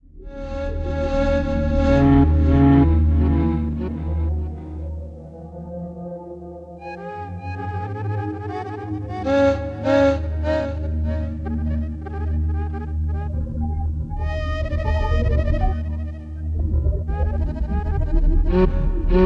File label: sound waves lofi